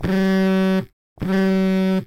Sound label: mobile phone vibration